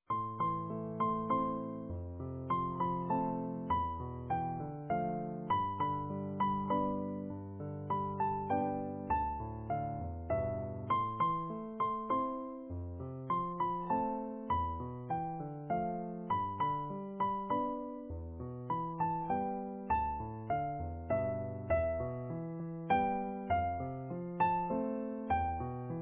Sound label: easy piano